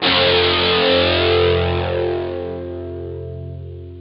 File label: GUITAR, POWER GT